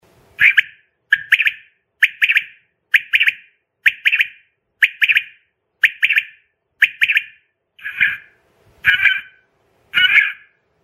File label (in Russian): manok perepela